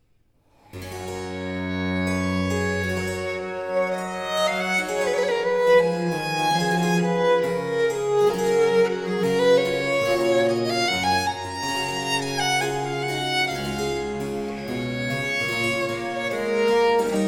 Sonata in G Major Adagio

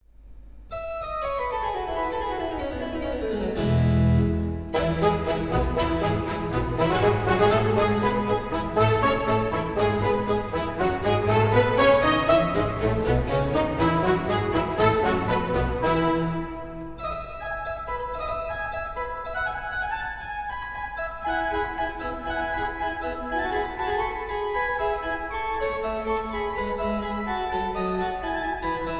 Organ Concerto in F Major Allegro; HWV 292

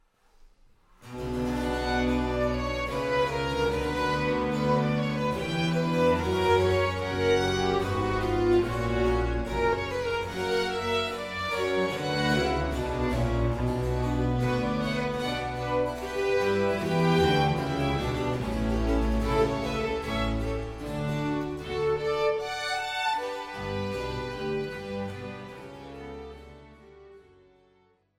Divertimento I in C minor Largo; Avondano

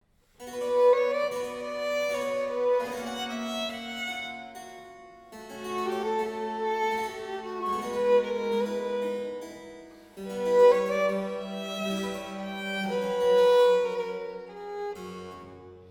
Sonata in c minor Andante

